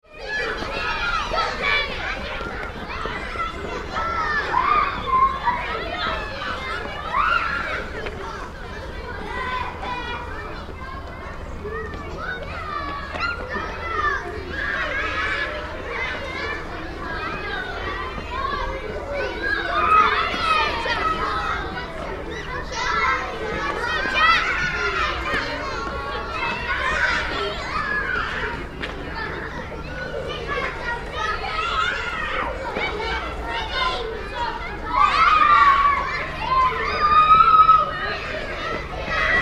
Children in Playground 1 - Primary kids playing - lots shouting; lots shouting and screaming